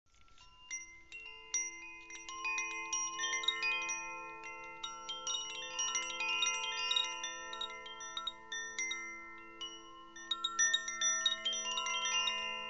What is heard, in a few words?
snow, carillon energetique douceur